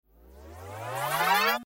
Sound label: Cartoon Ascend Rewind; Cartoon Music; Cartoons; Blastwave